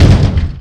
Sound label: land; PC Gamer IT CD 31; SegaTC